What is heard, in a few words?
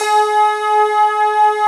D50 Layer, Roland; 90s Sample CDs; Club-50 - Foundations Roland; D50 Syns